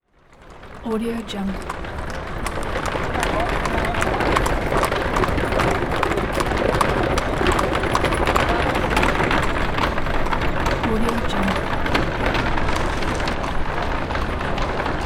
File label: horse drawn carriage medium pass by on cobbled street; hollywoodedge